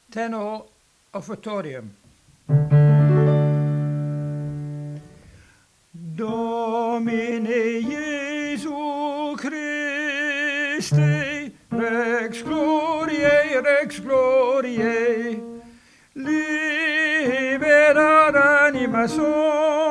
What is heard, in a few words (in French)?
tenor Domine Jesu Christe voix seule; 2020 Mozart Requiem